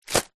office document folder wallet plastic set down or drop